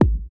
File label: THROAT; Spectrasonics; Metamorphosis; THROATERS